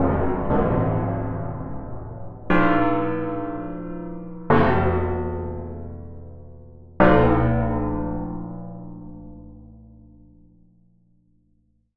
bangs

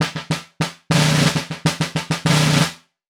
snare piston sound

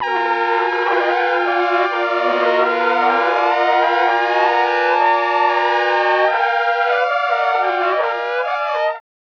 brass random combo

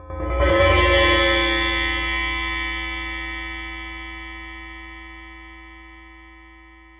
cymb

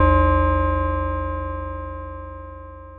cymb

